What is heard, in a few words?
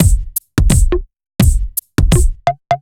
Kit Heavy Swing, 85bpm; off the grid